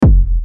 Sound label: KICK; Unison Artist Series; Victor Ruiz "Power Pack" Vol. 1